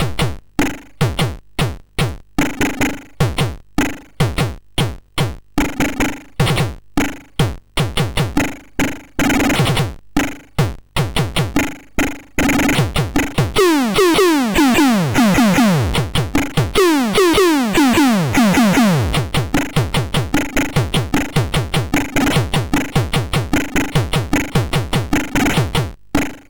Drum, MOS6581R4

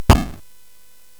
SFX; Narco Police; hvsc

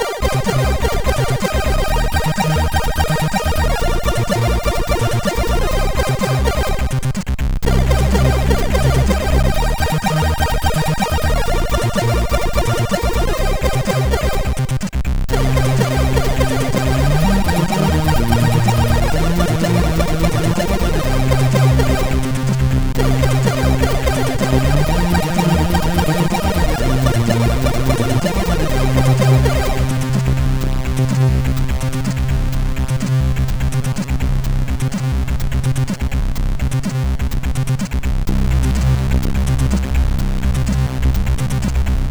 Overdrive sid MOS6581R4

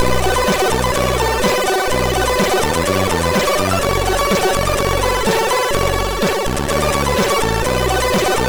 Jingle, MOS6581R2; Hobbit; JB-Jingle

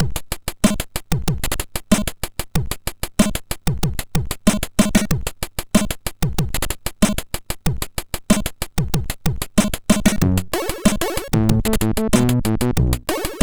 Dub, MOS6581R4

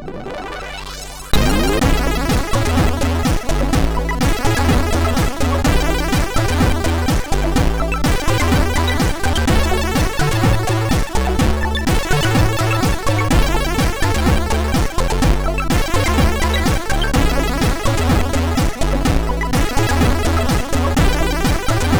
MOS 6581R2; sid; Electric Starbounce

